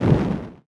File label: flame end; Rust